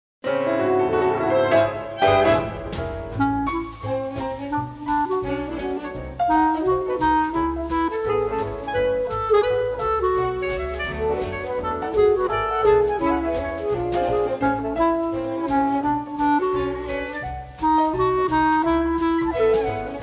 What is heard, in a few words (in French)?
clarinette